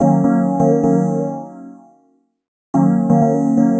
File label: 126 Eb MUSIC; funky; Loopmasters; Funky Underground Vol 2